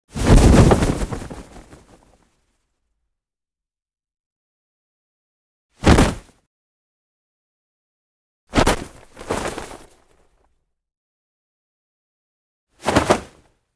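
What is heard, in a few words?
sounds of parachute jump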